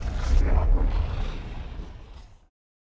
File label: Lava Hit